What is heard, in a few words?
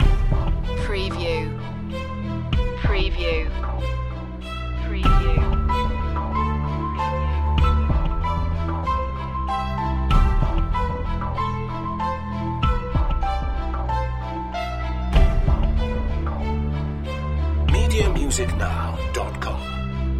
tension loop